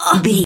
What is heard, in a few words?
voice female hurt pain